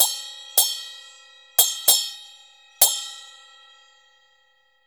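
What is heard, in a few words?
CHINA SM6 L, China cymbal; Spectrasonics - Heart of Asia CD2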